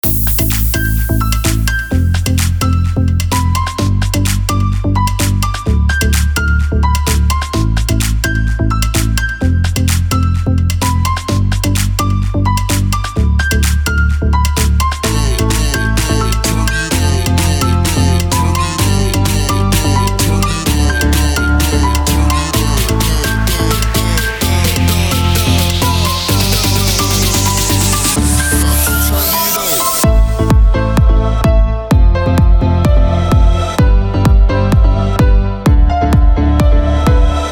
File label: Piano Ambience